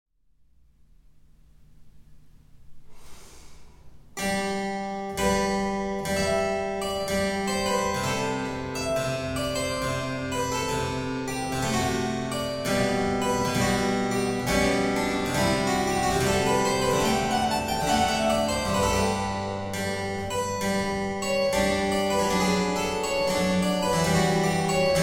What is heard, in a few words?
Keyboard Sonata in A Major, Adagio e cantabile